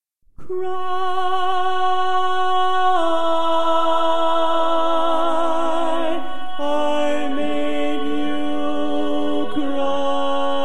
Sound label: Cry, Bari